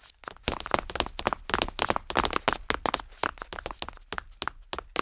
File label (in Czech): beh; Mafie 1